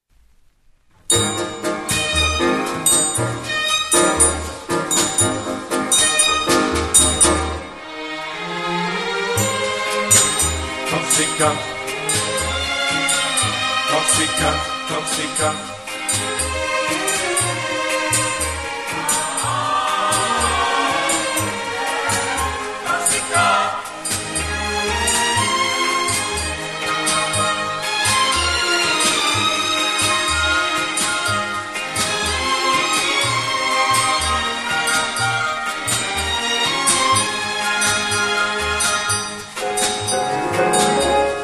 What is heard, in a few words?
blue strings orchestra corsica